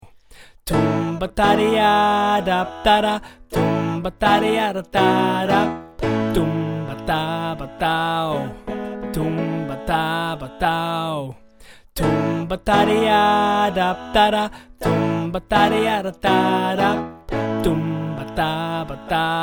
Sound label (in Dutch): tenor